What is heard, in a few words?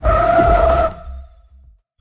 boar charge; smite; god artemis